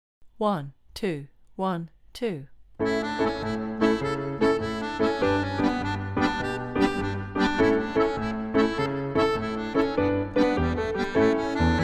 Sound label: Ellies Jig backing